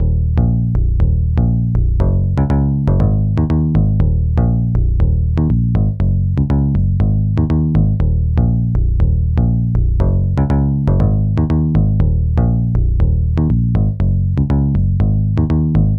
Bass; Arturia DX7V

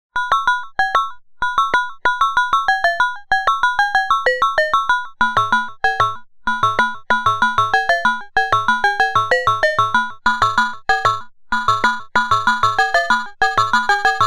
4 VCOs 2 LFOs; Io Instruments Pasiphae

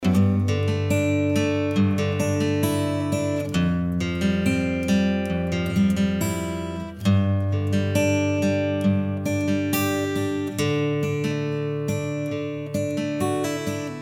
AcGuitar Cambridge DreamVerb

